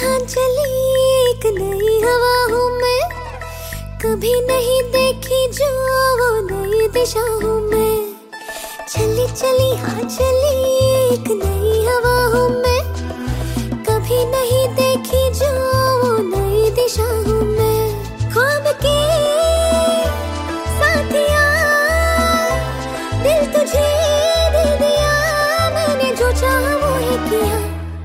song ringtone bollywood ringtones

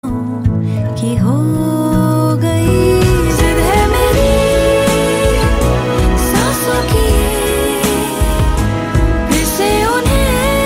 lovely bollywood song tone ringtone

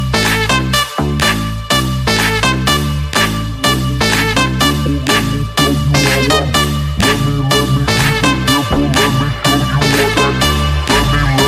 english music

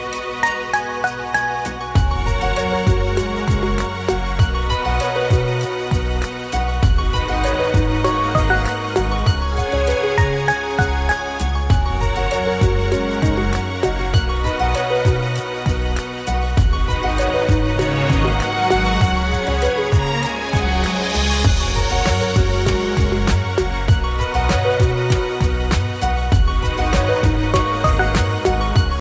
turkish ringtone

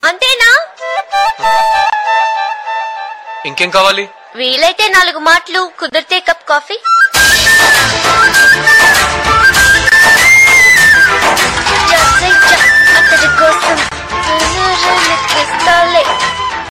romantic tone ringtone

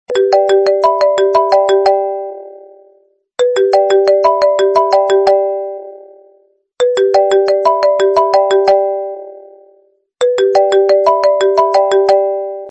i phone msg ringtone

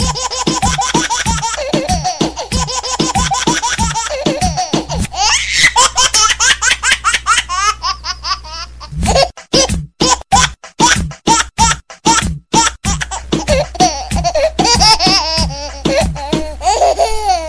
rap ringtone

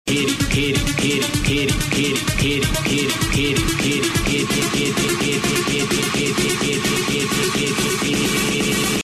hip hop

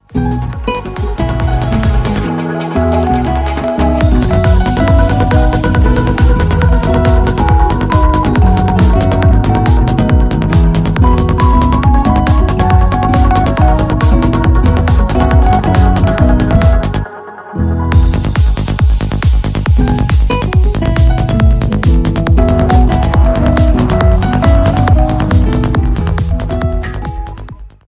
lg v60 thinq 5g uw ringtone

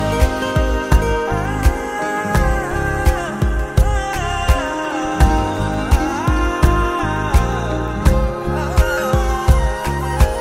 music ringtone, bollywood ringtones

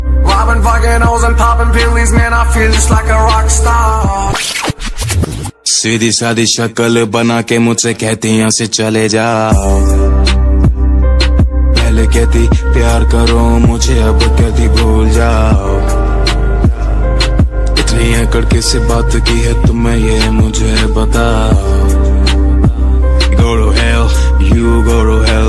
status song ringtone, bollywood ringtones

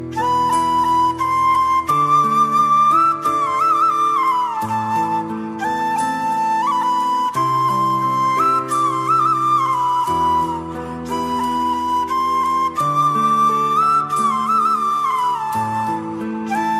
instrumental ringtone, bollywood

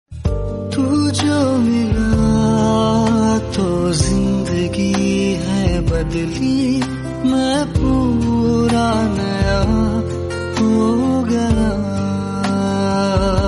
love soft music tone ringtone, bollywood

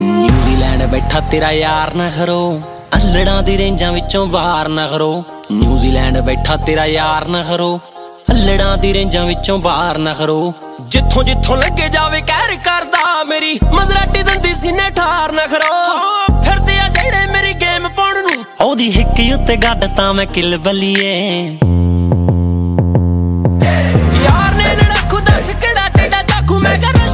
punjabi ringtone

